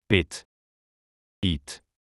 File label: bit beat